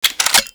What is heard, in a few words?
Grineer Four Barrel Rifle mech load